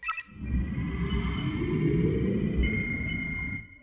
stellar zoom out, technology federation

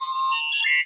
voice input, computer; cardassian

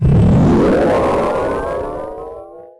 shield raise, technology federation

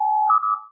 voice input, computer; federation

